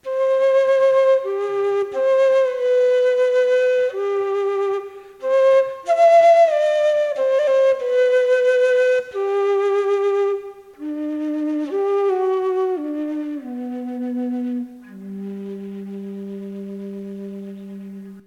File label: quena bass